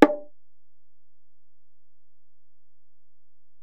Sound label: Ac Perc, Drum Machines Alesis DM-PRO